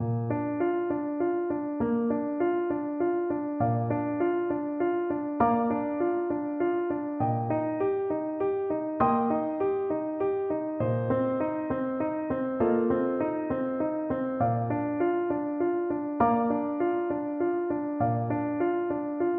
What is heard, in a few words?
Baritone Saxophone